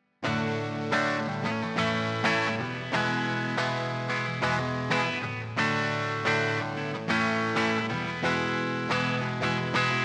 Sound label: guitar eq after